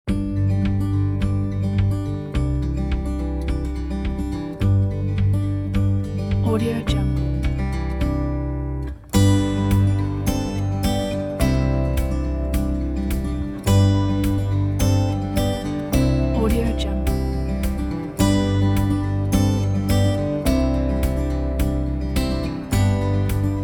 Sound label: happy acoustic folk traveling